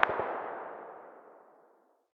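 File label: light machine gun very far distance; tacz-tweak-pack; taczmechanics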